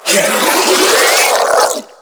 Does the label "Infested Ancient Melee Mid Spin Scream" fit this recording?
Yes